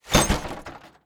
metal drop

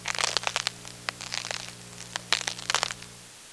ice crack

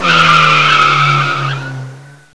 RUG PEEL

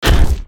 door kick

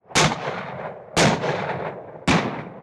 outside rifle shots

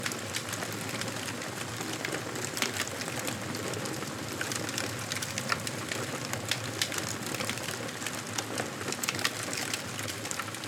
campfire

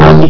DEEP BOU

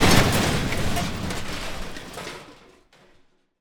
metal debris

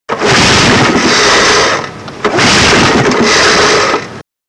CRANKING

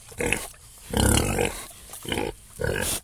pig grunt

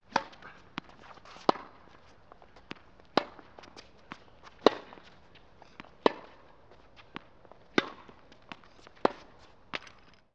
Tennis match

